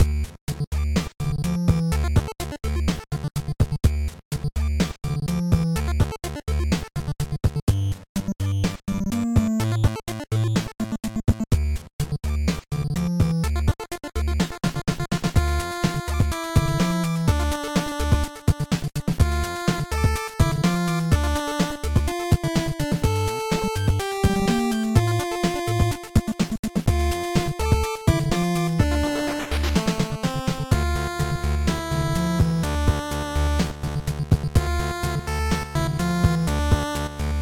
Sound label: Cave; Alwa's Awakening